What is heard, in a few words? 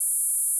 Gauss Filt E4